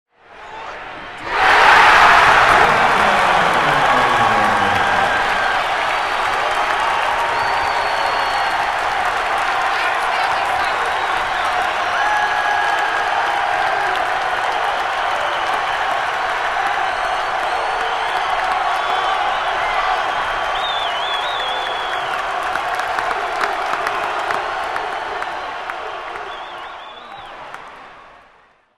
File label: Cheers from the fans; standing ovation